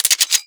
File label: Tommy Gun Full Auto Switch; Warframe; Tenno